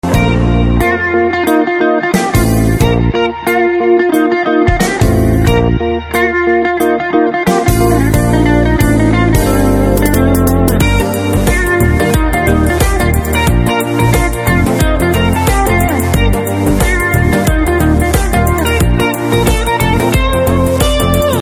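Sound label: background Tone Telugu Ringtone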